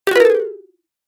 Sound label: Falling Fail Fast, video game